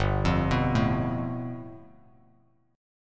G 9th, Bass Standard; arpeggiated

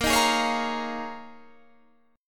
D sharp 7th Suspended 2nd Suspended 4th A sharp

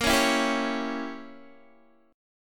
Bb Diminished 7th Bb Guitar Open D, chord; x,13,11,10,10,11

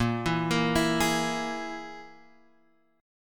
G sharp Suspended 2nd Sharp 5th, guitar chord; 6,7,8,9,9,x

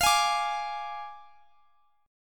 F Minor 6th, chord; Fm6; strummed

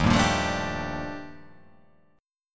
D sharp Major Sharp 11th D sharp, chord

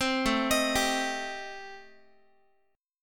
A Minor 7th Flat 5th A, Guitar; Am7b5 arpeggiated; 4A,4E,5A,5D,5G#,6C#

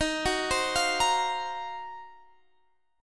D sharp Minor 6th Add 9th; D#m6add9 arpeggiated; 23,21,22,22,23,x; Standard

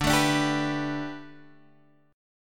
D Suspended 6th D, guitar

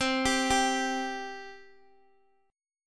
C 5th C down Guitar; C5 arpeggiated

